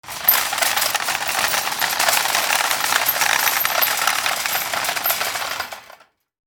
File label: Shopping cart rolling long